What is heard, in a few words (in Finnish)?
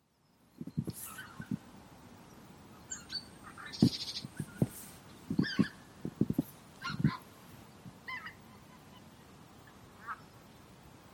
tundrahanhet; linnut